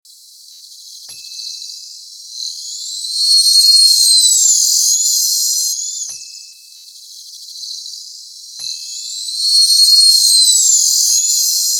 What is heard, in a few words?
Dentist Drill